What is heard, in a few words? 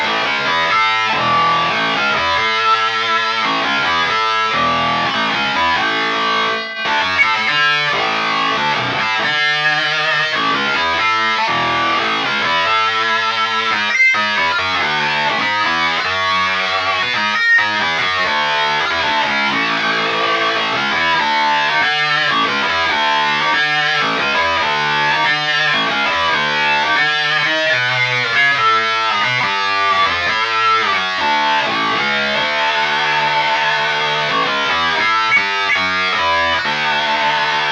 Axe Fx Synth